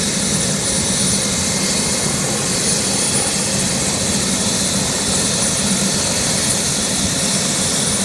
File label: starter loop; FGUK; Robinson-R66